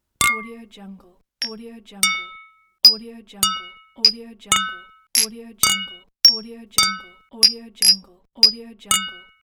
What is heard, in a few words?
clinking of glasses pack